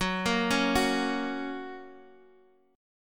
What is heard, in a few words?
F sharp Major F sharp Guitar; 13,13,13,11,x,x; arpeggiated